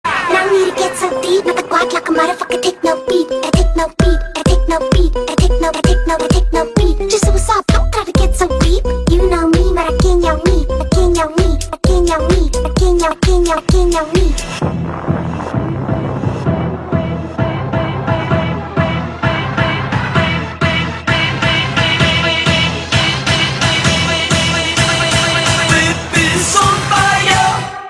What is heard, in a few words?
a techno beat a techno beat